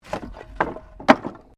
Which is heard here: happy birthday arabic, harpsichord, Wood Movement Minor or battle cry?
Wood Movement Minor